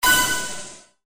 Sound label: Got Set Big; bejeweled 2 deluxe